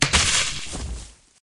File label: Explode Head; Lethal Company; Windows